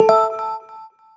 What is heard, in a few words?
reflect; BlackBerry OS-10; bbm